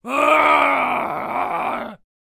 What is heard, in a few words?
Headbutter Attack Vocals